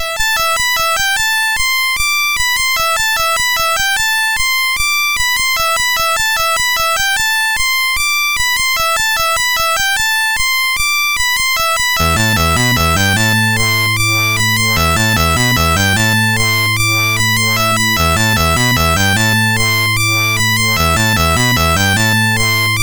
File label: MOS 6581; MOS6581R4